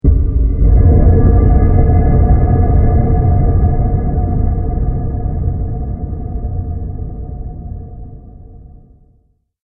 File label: Epic Scifi Horror, scary tone; Twistedtracks